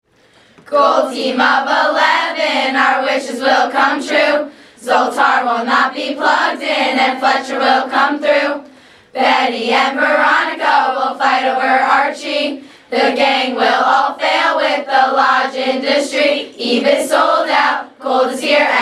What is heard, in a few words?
March